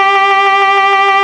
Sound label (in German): geige; akustik